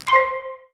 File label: spell selection; Test Realm